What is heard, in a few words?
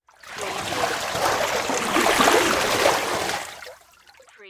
Water Walk Wade Heavy; Sound Effects Bible